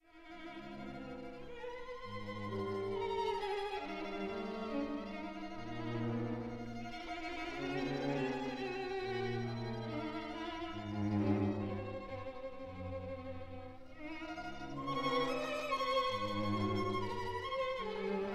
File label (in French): quatuor a cordes; RTB